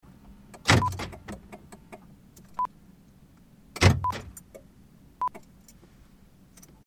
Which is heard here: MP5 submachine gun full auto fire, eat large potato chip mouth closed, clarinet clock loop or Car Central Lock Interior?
Car Central Lock Interior